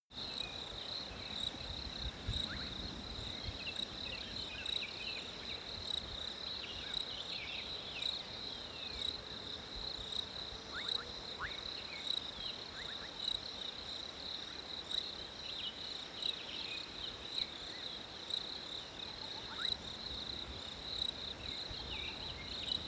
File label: africa winter bird